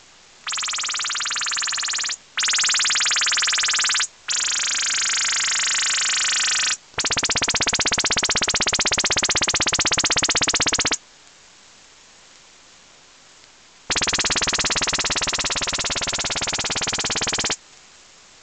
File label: ROTHR 3x9kHz 2x18kHz, AN/TPS-71 OTHR sending 3 bursts with ~9 kHz BW (2 x 19.1 Hz & 1 x 26 Hz) + 2 bursts with ~18kHz BW (1 x 16Hz & 1 x 17.5 Hz); AN/TPS-71 US NAVY RELOCATABLE OTHR SYSTEM